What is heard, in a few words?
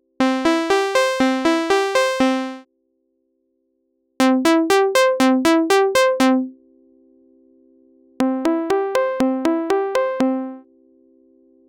Synth; プロの音プロの技・令和版